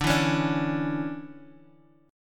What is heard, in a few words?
D Major 7th Suspended 2nd, Bass Standard; strummed; JGuitar